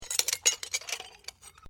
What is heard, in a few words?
GLASSES BROKEN FRAGMENTS MOVEMENT, Kitchen glasswear; Glasses (Sound Effects:Bars)